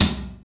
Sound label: TOM, Kit s; 90 s Sample CDs; Sound & Vision - Gigapack I CD 1 (Roland); Real-Kit s 2